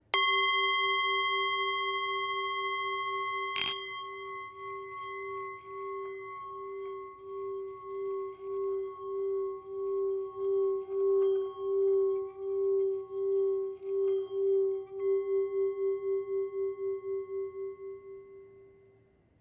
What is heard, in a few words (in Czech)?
tibetska misa; nagpa mala